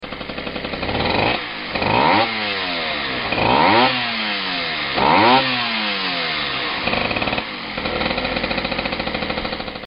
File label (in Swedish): Novolette flinta varmkor; Husqvarna